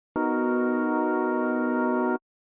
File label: Chord Spectrum